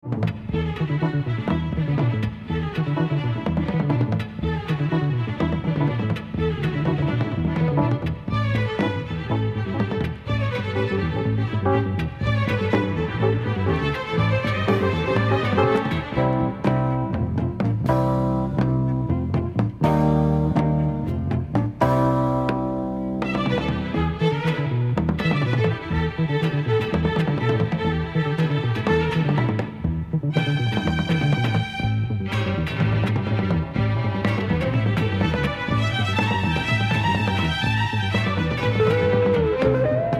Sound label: rhythm & Strings